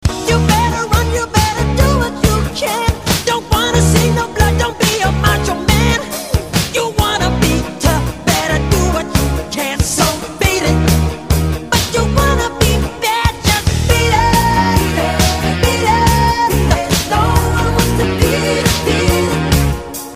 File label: beat intro